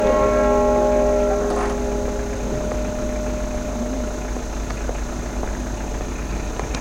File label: bell sounds; greatbede